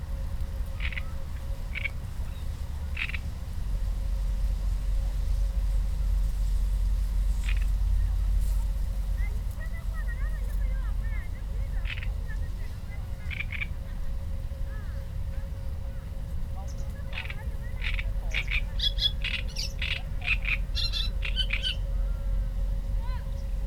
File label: warbler great reed Acrocephalus arundinaceus zarudnyi